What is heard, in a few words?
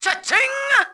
chaching